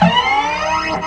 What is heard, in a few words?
SFX - WALKER2A; Jeff Wayne's The War of the Worlds